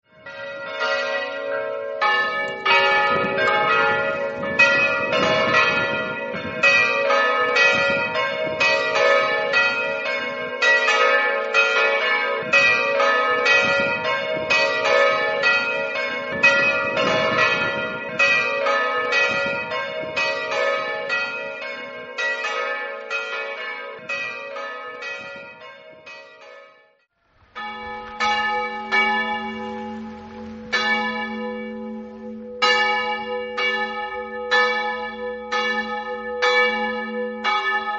belvedere, campane

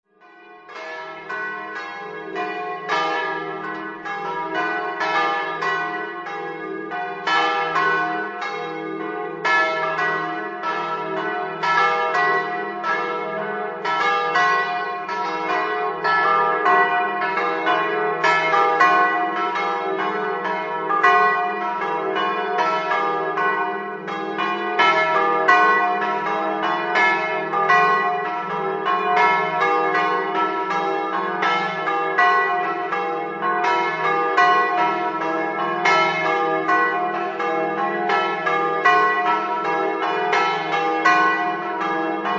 malisana, campane

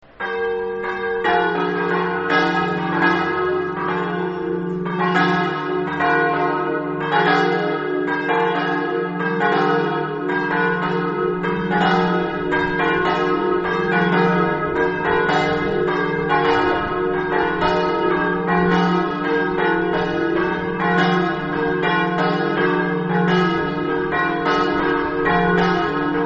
rualis, campane

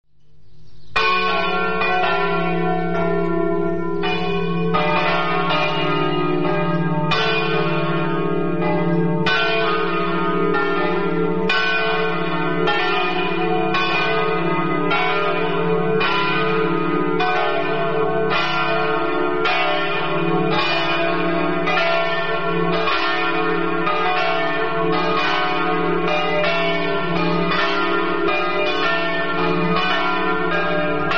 orsaria, campane

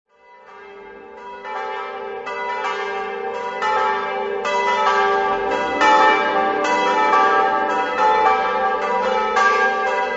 smarco, campane

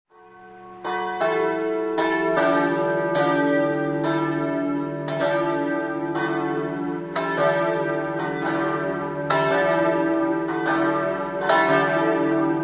campof, campane

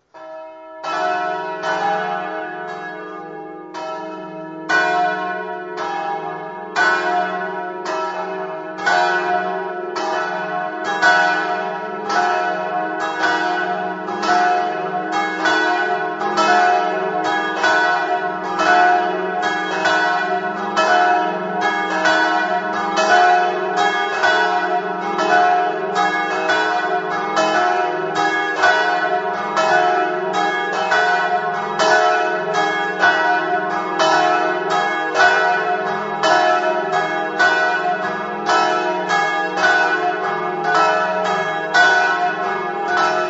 terzo, campane

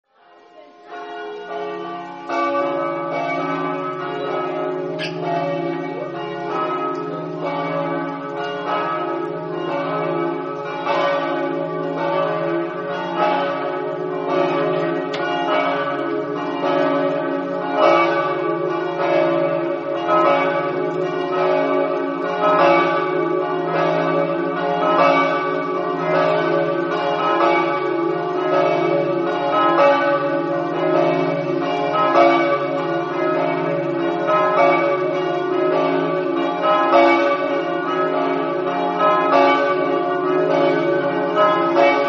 prestento, campane

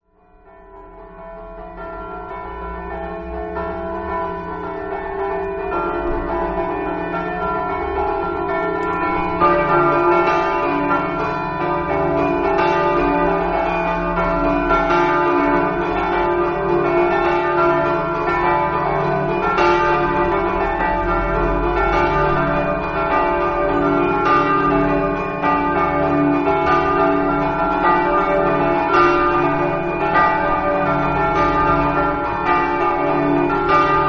rizzi, campane

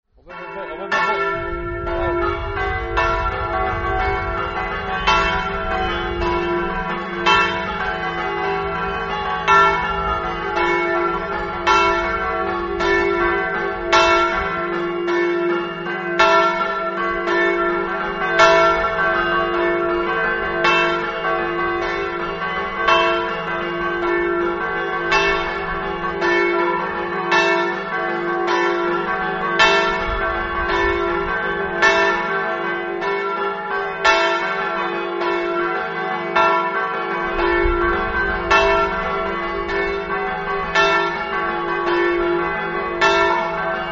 ipplis, campane

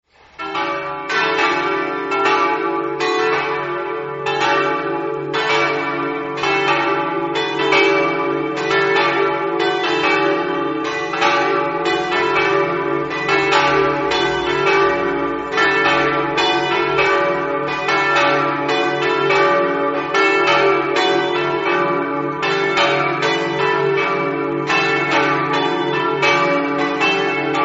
sevegliano, campane

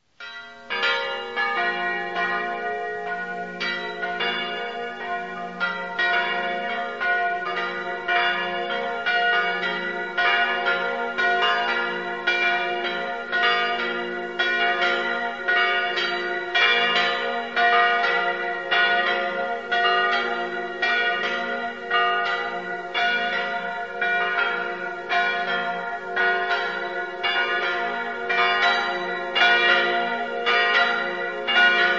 dolegna, campane

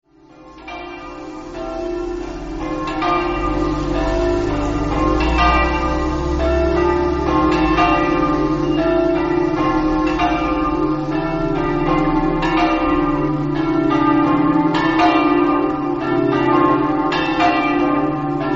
varmo, campane

